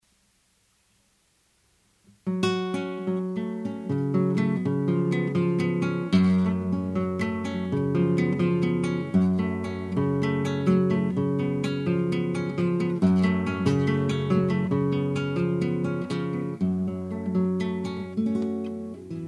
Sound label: Spanish Serenade